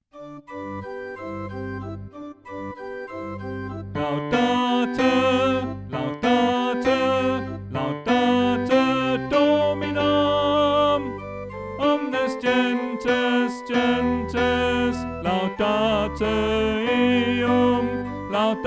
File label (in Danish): Tenor